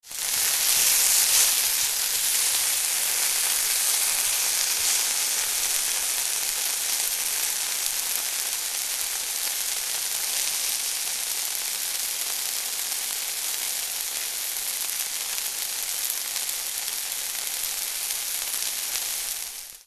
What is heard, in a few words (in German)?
bruzzelsound; grillschokolade